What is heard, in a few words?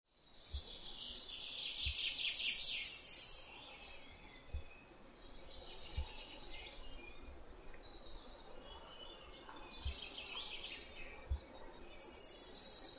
firecrest